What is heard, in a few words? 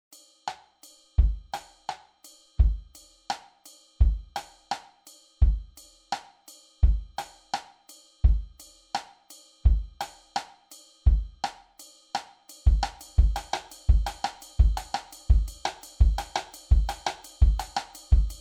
AIR Strike Ska Kit